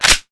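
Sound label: crossbow clip in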